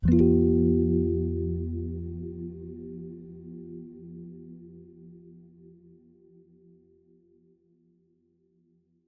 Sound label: pseudo pulsar; ゆめ2っき